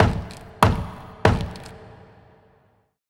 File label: Move